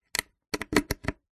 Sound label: technology quater inch phono lead connector plug in speaker